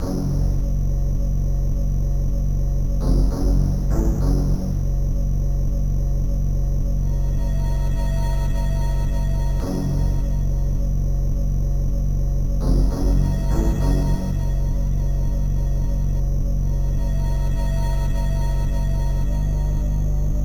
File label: Farm Ambient; Perihelion The Prophecy; Amiga